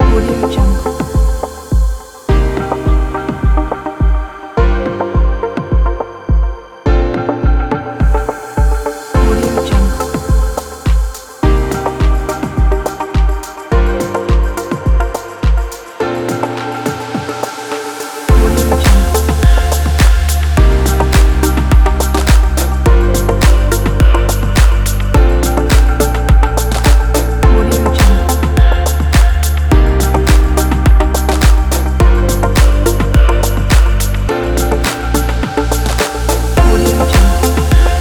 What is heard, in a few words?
fashion wave